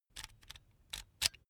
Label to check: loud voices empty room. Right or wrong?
Wrong